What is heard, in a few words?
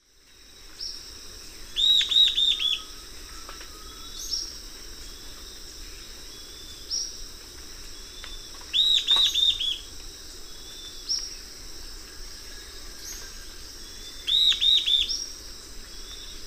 Lathrotriccus euleri, song; S. America; Eastern Lowlands